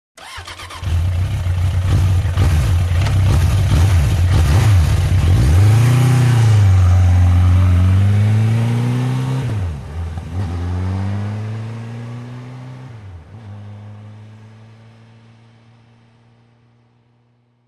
Motorcycle Road Racing

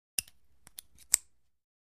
Biking Snap Chin Str

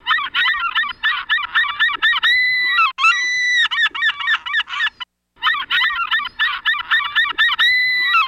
Seagulls Crying Shri

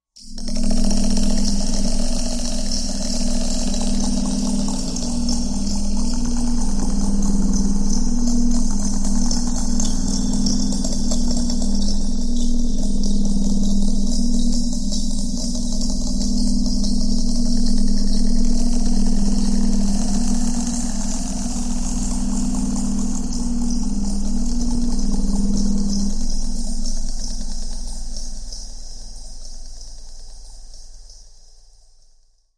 Amb Wood Amb Rattles, horror

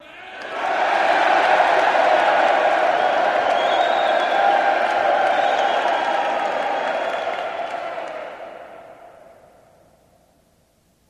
Crowd Ohh Reaction, big arena

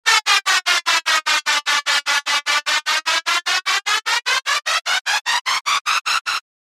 Flange White Noise, pads

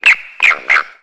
Frog Tree Call Eerie S

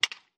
Aluminum Bat, baseball; baseball fx & games

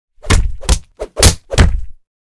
Block Punch Combo; punches kicks hits bones

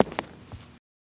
FS Male On Hardwood, singles wood

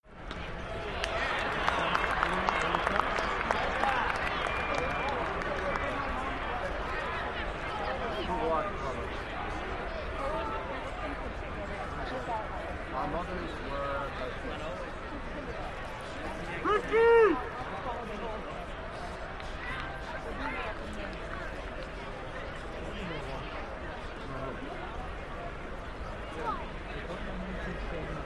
Arena Crowd; Crowd, Active, Hit, Ohhs To Cheer.

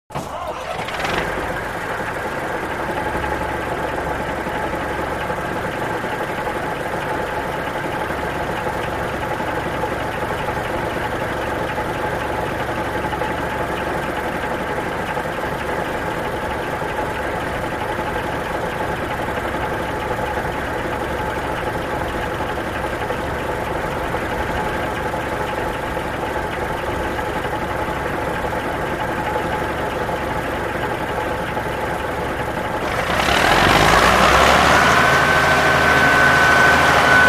Bog Cutter; Bog Cutter Start Up, Idles And Away.

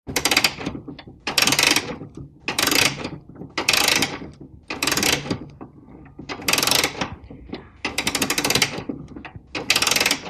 Mantle Clock Wind

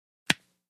Candy Bar Crunch Bite

